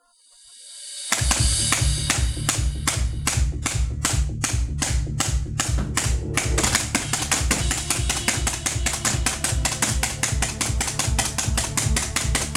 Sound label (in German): Musik